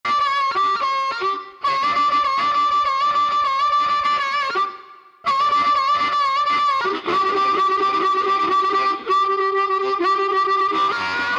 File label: BLUES HARP LKS, American Heartland; Sonic Reality